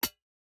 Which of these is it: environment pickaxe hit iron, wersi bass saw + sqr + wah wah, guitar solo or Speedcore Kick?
environment pickaxe hit iron